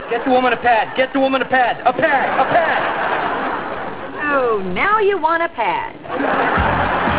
pad

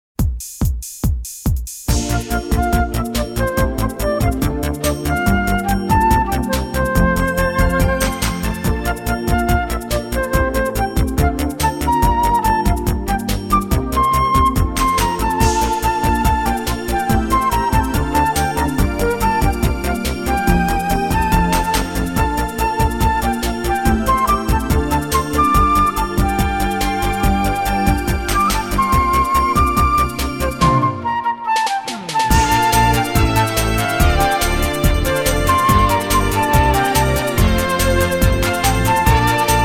Flute theme

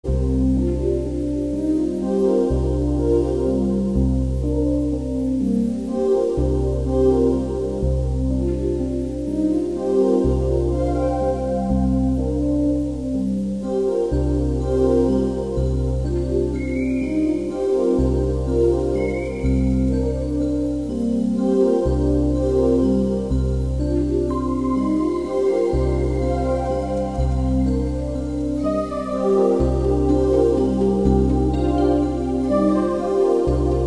music

